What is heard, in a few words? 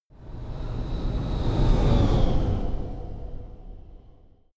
sci-fi vehicle train passing; raumschiff